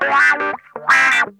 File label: TIMER, Funk Guitar; Zero G - Funk Guitar